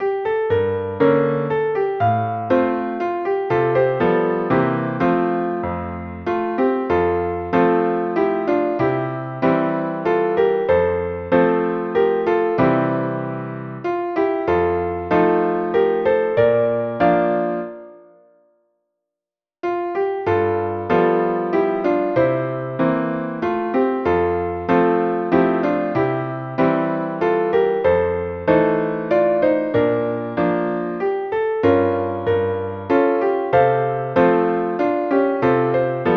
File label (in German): Klavier